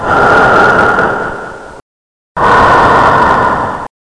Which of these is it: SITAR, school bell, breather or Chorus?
breather